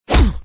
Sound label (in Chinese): 人摔在地上